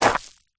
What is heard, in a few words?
foley footstep shoe kick stones ground small; zapsplat